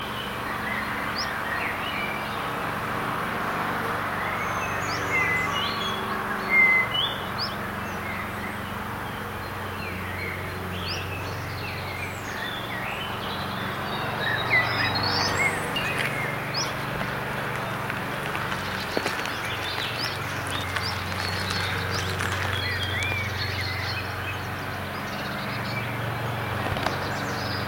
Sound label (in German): zilpzalp